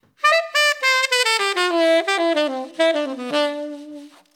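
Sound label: 8beat D major 110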